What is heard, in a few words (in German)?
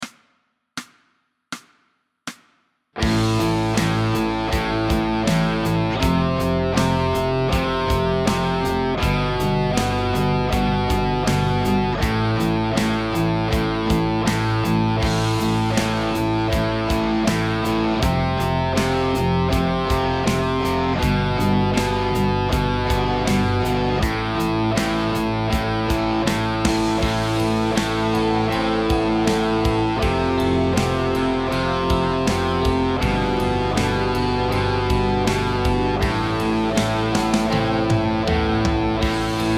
Single Note Riff Song Hörbeispiel